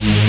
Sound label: SYN Technomani; Best Service Gigapack 1